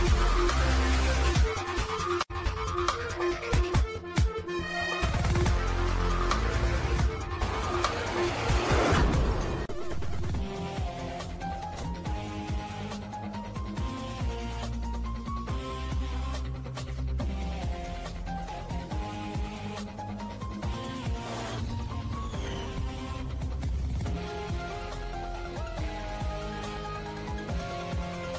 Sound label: BGM; CID RINGTONE